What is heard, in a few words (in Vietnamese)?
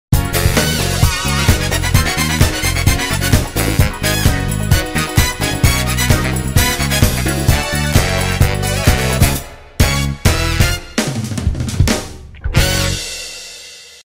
Nhac dao su kien lam show dao dam cuoi